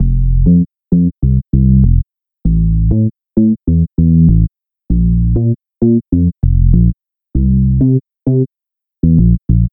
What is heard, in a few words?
Dancehall Afrobeats